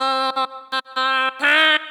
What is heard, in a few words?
VOICE GRL HOUSE 125 A, House Voice